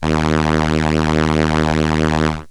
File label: Solina Viola D#1; Analog Synths; Ableton Foundation FreePacks